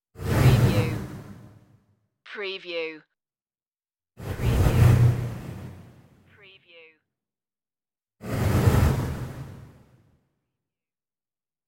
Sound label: windy logo whooshes